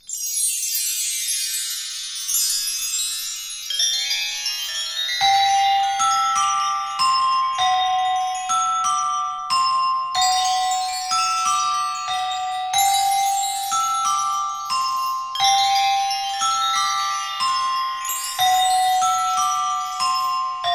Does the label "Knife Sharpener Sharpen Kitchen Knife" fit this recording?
No